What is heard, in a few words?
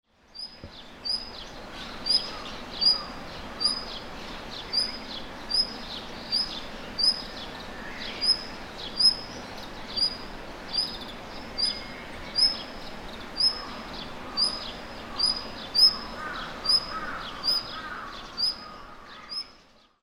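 Blue Rock Thrush, izu; isohiyo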